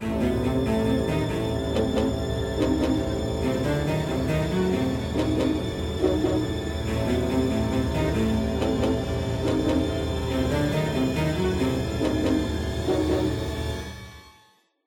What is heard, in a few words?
Alert; Snowy Layer; tintin reporter cigars of the pharaoh